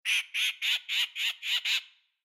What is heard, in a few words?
crow; the wolfs night